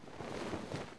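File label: pocket; kapsa; Mafie 1 cz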